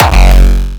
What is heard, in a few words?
hardstyle f